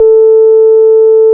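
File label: Juno 106 resonant band pass A1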